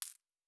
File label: Coin; Fantasy Sounds Bundle